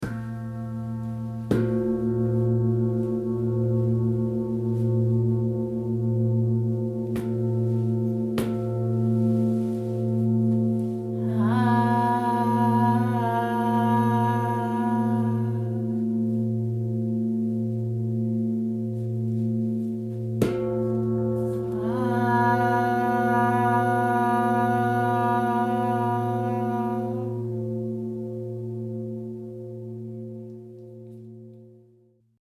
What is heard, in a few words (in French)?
harmonie bol et tincha